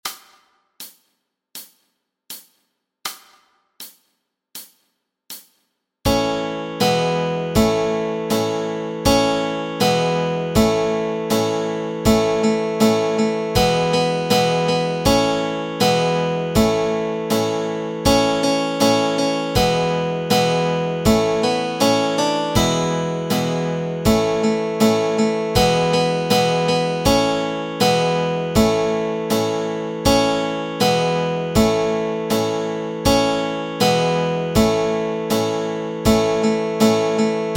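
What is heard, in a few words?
Melody and Chords Track, Easy Guitar and Ukulele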